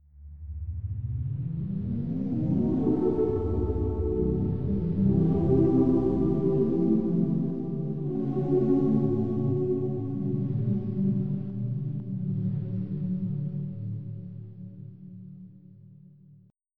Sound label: voice hall, Choir